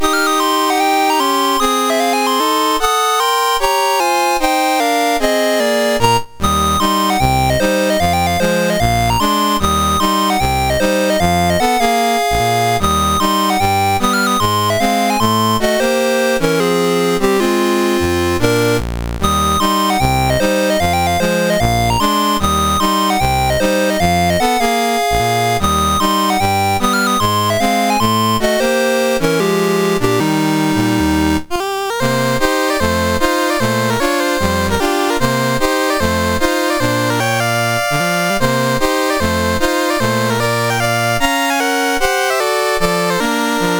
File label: Jazz Legato, MOS6581R2; Dave Marquis